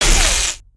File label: Impact Wrench